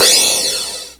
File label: PROCYMB, CYMBALS; USB Soundscan vol.20 - Fresh Disco House I